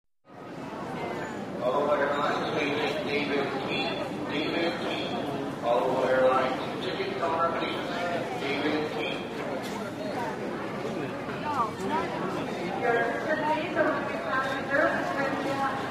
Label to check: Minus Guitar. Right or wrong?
Wrong